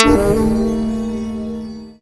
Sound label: Orient Note Pluck